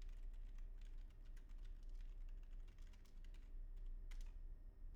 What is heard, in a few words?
keyboard; IEEE AASP